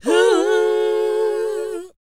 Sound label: WHOA E, Vocal R&B & Dance; ILIO; Vocal Planet